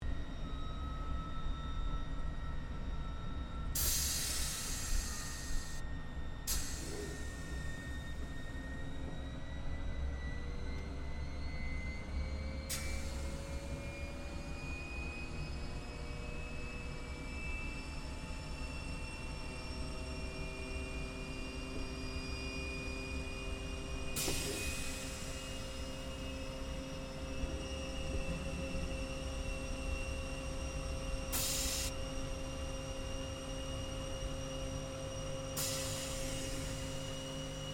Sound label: AIRCRAFT GLOSTER METEOR F MK8 TAXI INTERIOR STEREO, figter jet; Airplanes